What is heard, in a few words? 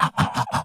Mouth Fx, Vocal